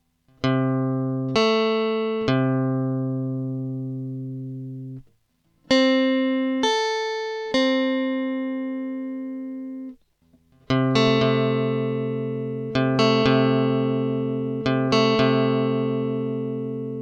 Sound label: major 6th interval; fretjam